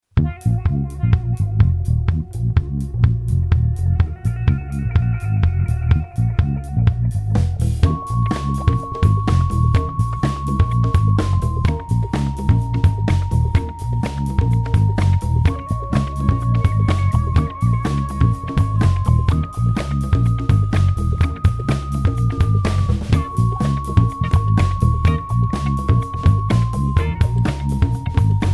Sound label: House